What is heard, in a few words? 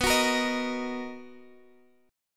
B Major 7th, Guitar Open G chord